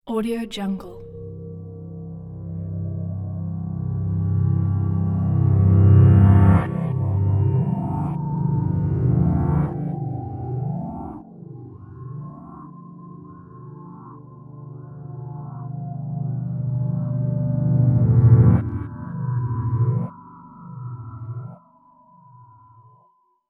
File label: creepy psychodelic reverse intro; fxprosound